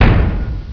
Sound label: metal